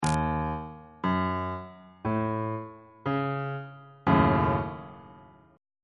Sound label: f sharp minor 6th 3rd inversion